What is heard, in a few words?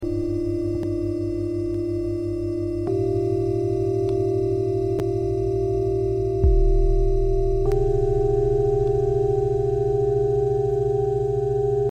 distortion